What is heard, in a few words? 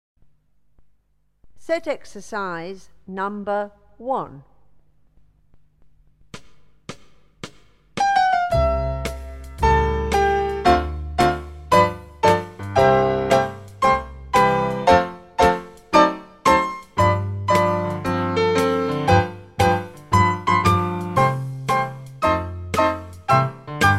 primary tap set exercise tap step ball change springs